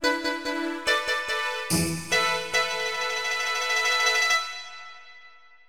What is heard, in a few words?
Jingles